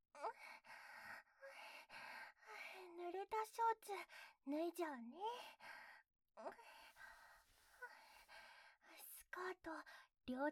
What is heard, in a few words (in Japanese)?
騎乗位・対面座位・耳舐め・ディープキス